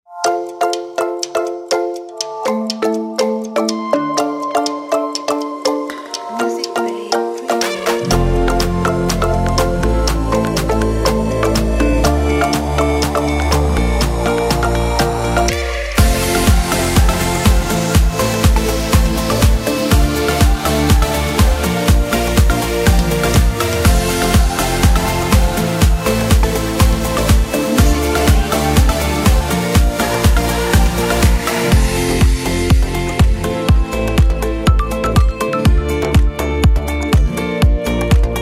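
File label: Uplifting Inspiring Summer Dance Pop Vocal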